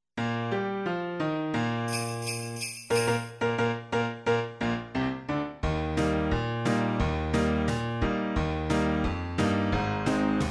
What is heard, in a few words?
jingle bells, band